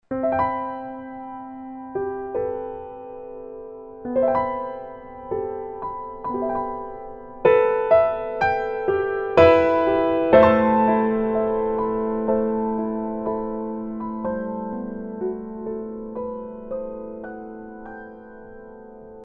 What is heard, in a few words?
piano, ringtones; Verykool S757